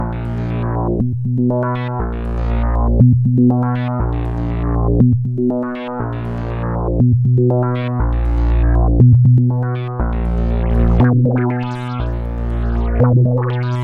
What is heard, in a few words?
Mutator OD Bass